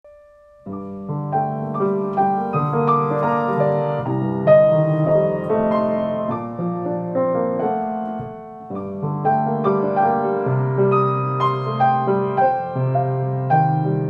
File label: Bosendorfer